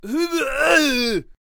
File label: Chieftain Attack Vocals